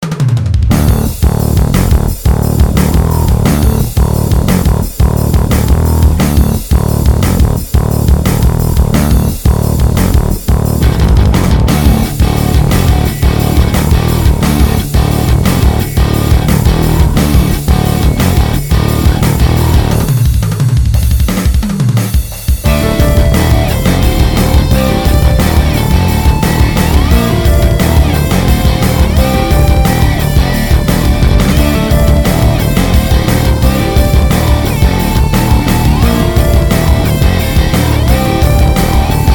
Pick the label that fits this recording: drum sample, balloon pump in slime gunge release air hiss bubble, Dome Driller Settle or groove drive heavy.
groove drive heavy